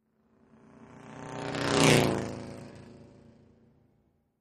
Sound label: Vintage Car Race Bys; antiques